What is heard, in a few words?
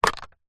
telephone landline plastic receiver pick up; household